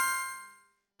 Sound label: magic completion